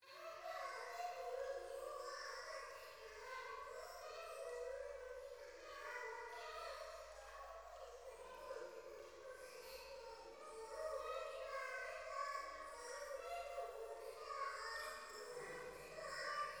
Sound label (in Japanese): 夢の中 可愛い喘ぎ 雨上がり